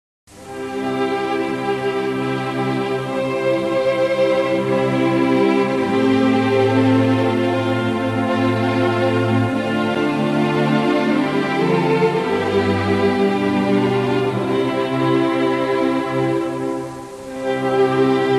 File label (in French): hymne luxembourgeois